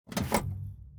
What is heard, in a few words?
Medical Kit